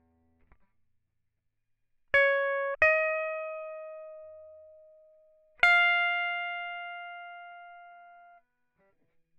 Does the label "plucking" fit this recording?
Yes